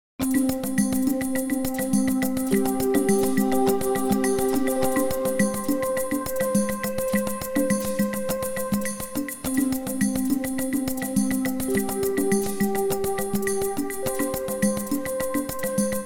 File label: Marimba Melody